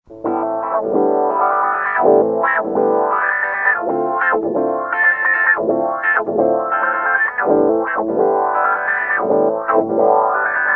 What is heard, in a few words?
wah